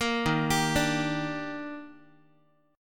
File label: D sharp Major 7th, Guitar; D#M7; arpeggiated